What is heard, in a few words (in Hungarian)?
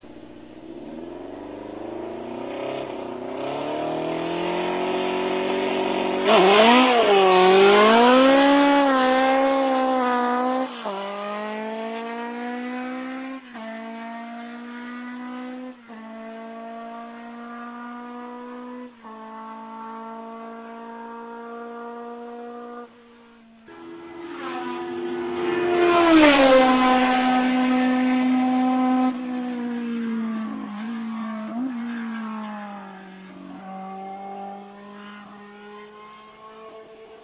Lola T297, motorhangok; autosport